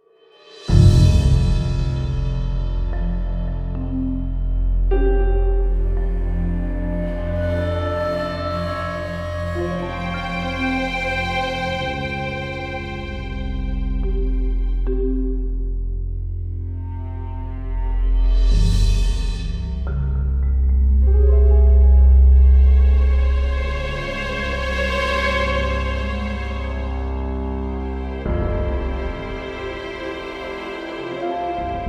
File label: Ominous place